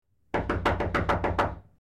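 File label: Rapid Knocking On Wood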